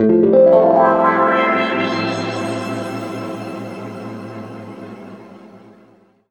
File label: A P-S WA2-L, Guitar; East West; Steve Stevens Guitar Samples Collection; PTCH-SHFT+WA